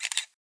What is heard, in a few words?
camera shutter